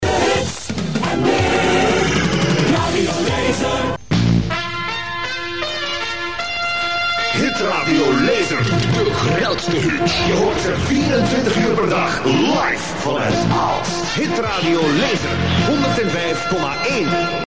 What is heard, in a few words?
jingles; Laser Aalst